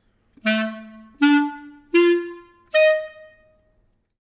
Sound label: Iris melody short accented